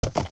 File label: Epona Walk; Epona walking